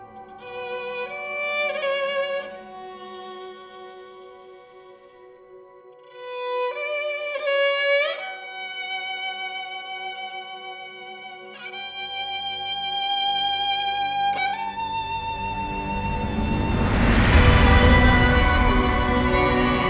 orchestral

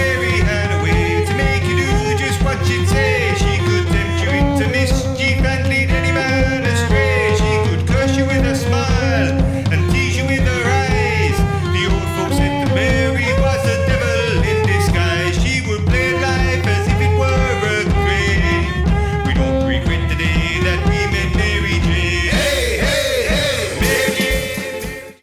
folk

